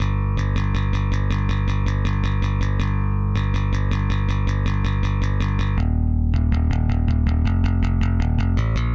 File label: Explore BASS A